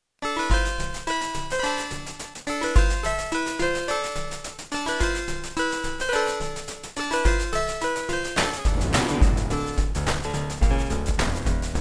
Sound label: Beepblaster 2.0 BEEPMODE; Lost Vikings